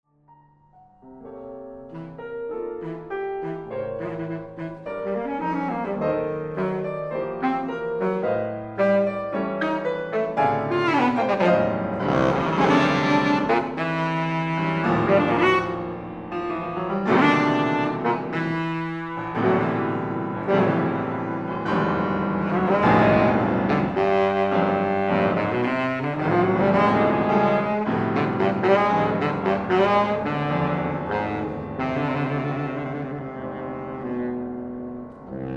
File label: baritone raucous